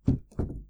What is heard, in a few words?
reset